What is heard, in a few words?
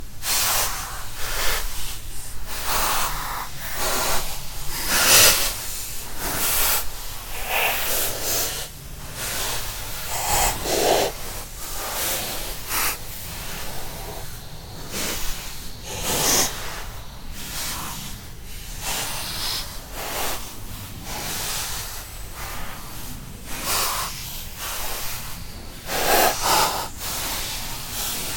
mad breath, horror